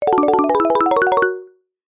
multimedia game sound slot machine win or spin mallet tone